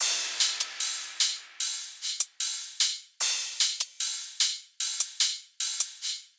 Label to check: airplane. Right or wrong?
Wrong